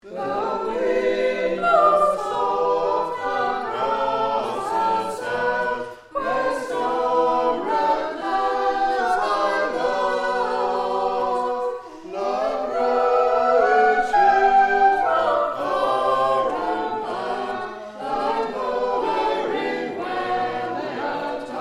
farquahar All

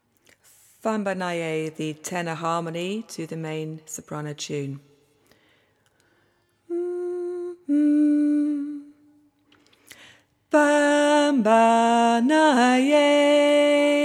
tenor bass harmony

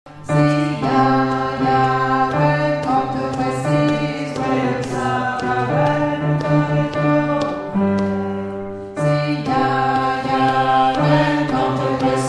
Bass

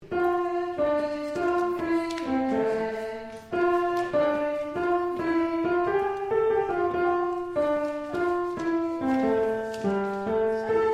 alto

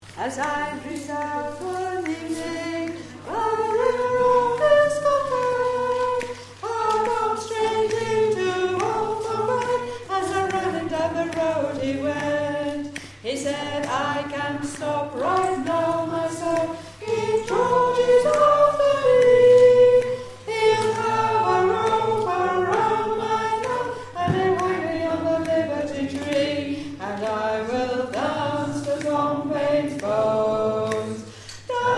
tune

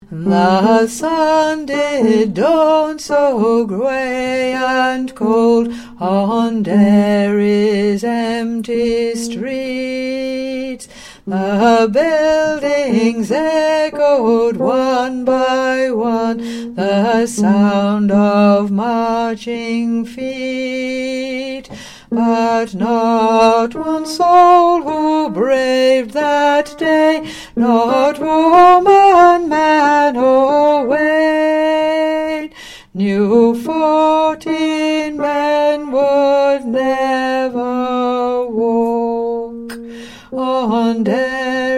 Alto; Derry Streets

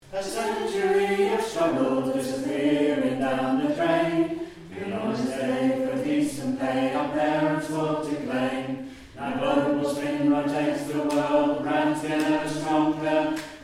Alto

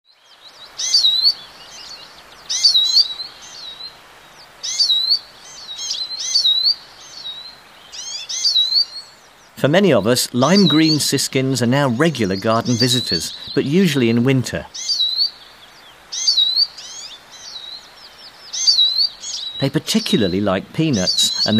Siskin; Tarin; bbc Bird Song Site

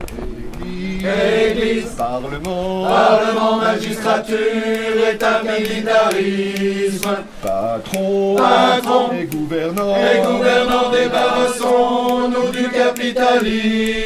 Tenor

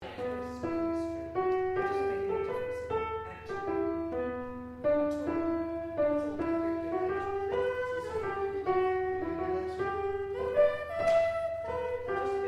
Alto

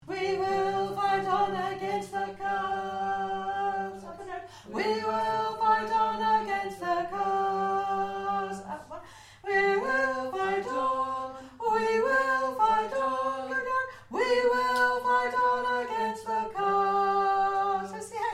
tenor

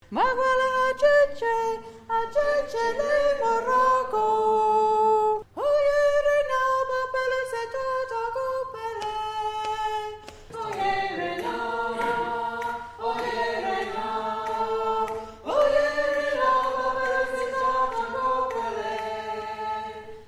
Tenor

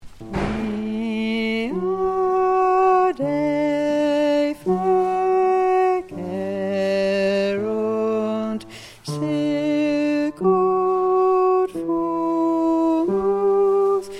we will remember Bass

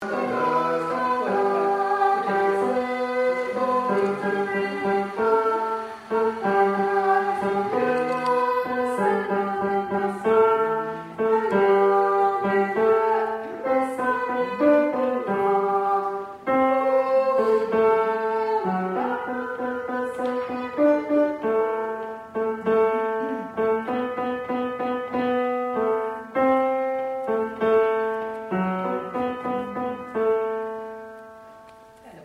Tenor

